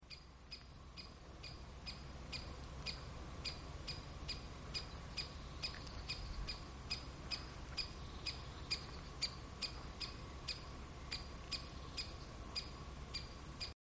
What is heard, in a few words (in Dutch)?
Grote Bonte Specht Dendrocopos major Geluid; Annelies van Klinken